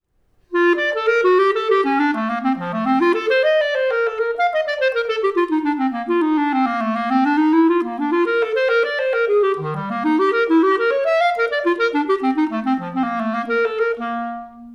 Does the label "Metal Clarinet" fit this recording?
Yes